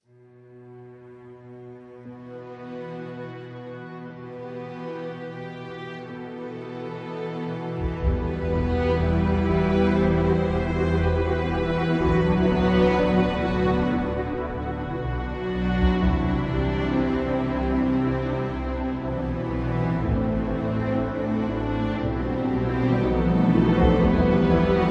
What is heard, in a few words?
Dramatic Ambient